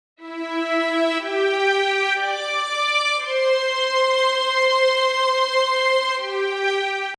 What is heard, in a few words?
Lead